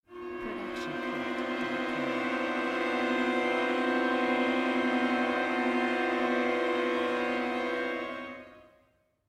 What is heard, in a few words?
string dissonance